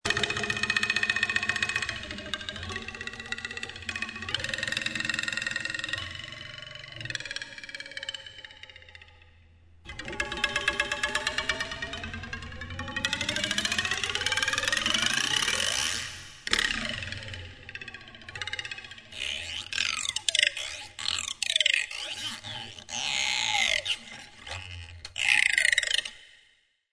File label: Funny Noise; Overhanging